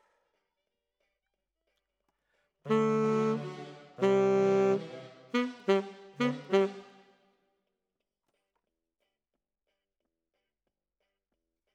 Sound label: Alto, RNR for piano